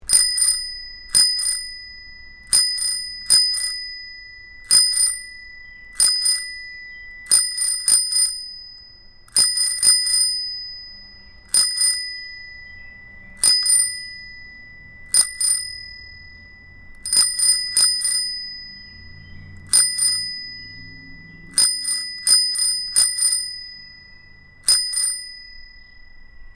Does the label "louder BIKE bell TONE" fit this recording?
Yes